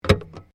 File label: Car Subaru Liberty 2001 exterior petrol cover close; Vehicles; Family members Subaru